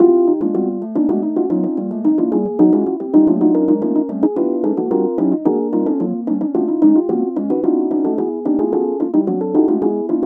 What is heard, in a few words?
harp harmonics 110bpm